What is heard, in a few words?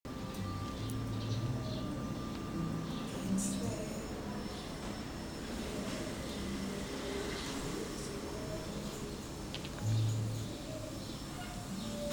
cihangir street corner